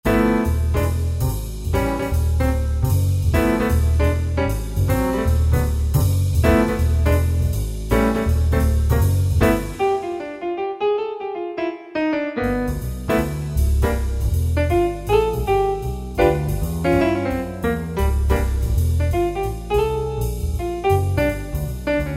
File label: Piano Trio